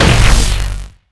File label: Arc Nova Diffuser Charge Impact; poppy playtime mecha catnap